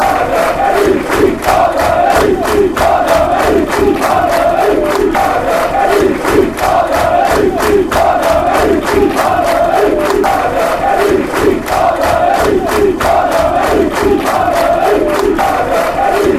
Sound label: bees bees fan chants